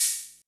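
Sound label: open, hihat; Pop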